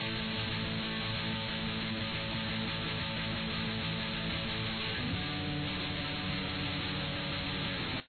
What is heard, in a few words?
chorus guitar